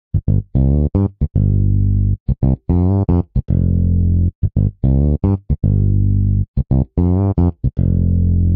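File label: Bass 3 African Funk G minor 120bpm; Guitar and Bass; Ableton; Factory Packs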